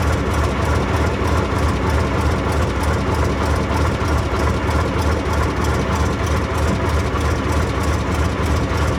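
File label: Plane P40 Interior Taxi Loop; Airplanes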